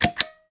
accept; Imperialism

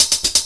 dr loop; Descent

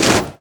swords n shields; Alexander

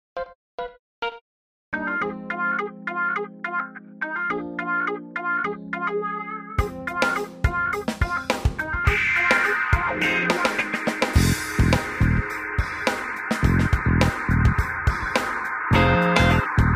disco; Virtual Guitarist El. Edition

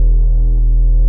hum

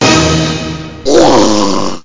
orch hit; kabloey redux

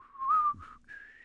whistling; The Sum of All Fears; English Voice

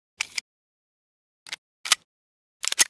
p38 reload, weapons foley; Spearhead Demo; MacFormat 2003 June

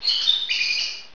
Chirp; Triazzle 1.0 Demo

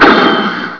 clank crash

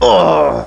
main death male

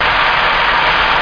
rain; NFS

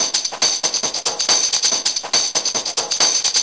PERC

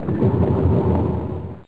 Burble; U-Boat 1.5.3

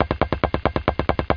Bonks Pop Bing

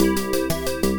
slow rock, pc organ; medsonic

